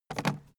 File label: aluminium case handling